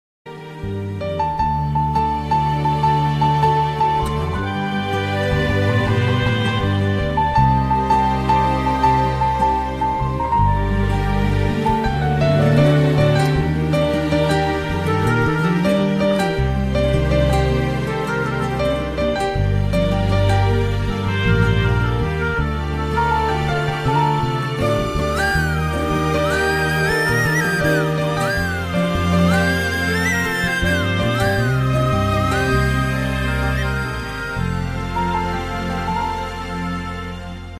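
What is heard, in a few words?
Tamil ringtone background music; ethir neechal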